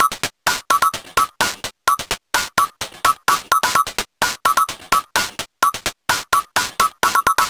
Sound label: VFX2 Loop 128 BPM